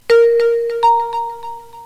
Jump Trip Note

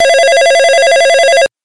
telephone

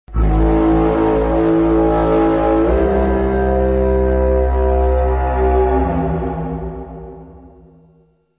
Horror Horn

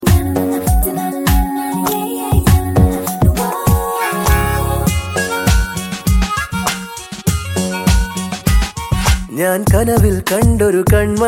Bass

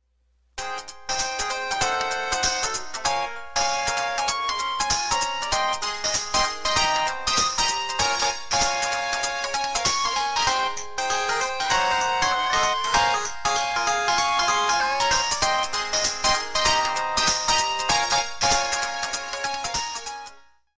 high kick ringtone; high kick 2

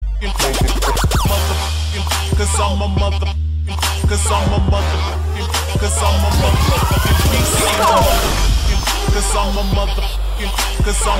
BGM

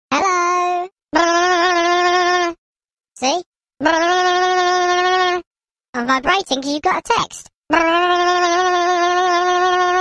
Baby Funny Vibrating

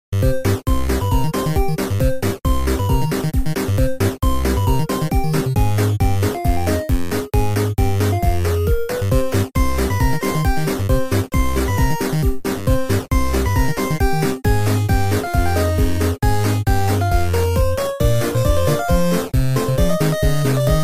Chiptune; Foster Home

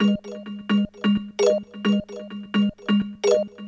Bells; Lost

